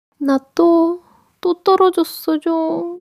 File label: crying